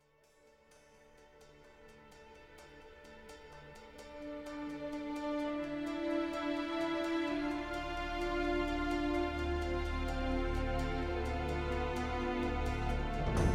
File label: Filmic Orchestral